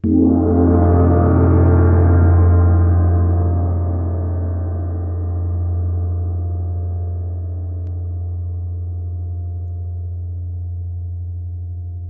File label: Inharmonic Tamtam